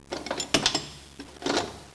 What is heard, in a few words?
fire, disaster